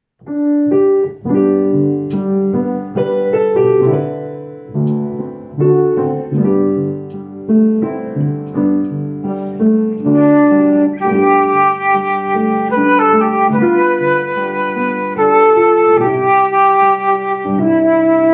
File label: with Solo